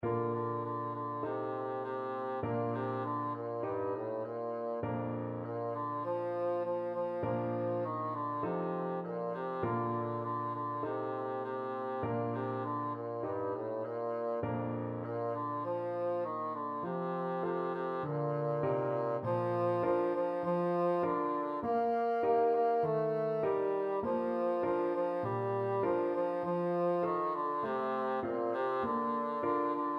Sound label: waltzing matilda, bassoon